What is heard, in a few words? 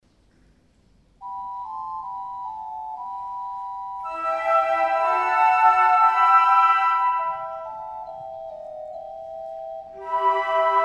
Uccelli, Floeten